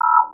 DTMF

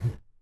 Carton Move

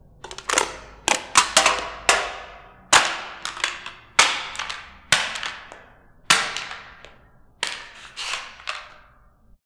Crushing Can

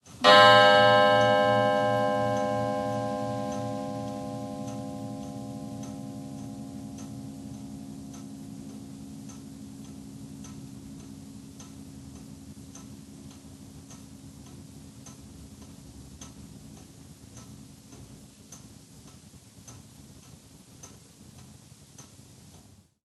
Old Scary Clock Sound

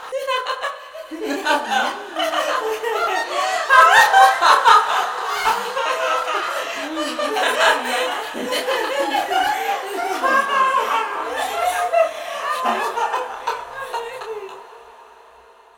Demon Witch Laughter

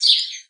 Single Bird Chirp

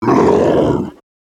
Demon Pain

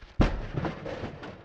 Tumbling Beat

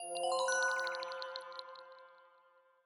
Mysterious Sparkle Flourish

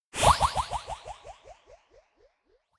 You Miss Your Attack

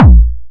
Kick

